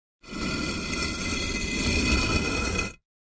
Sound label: Cinder Block Two Scrape, scrapes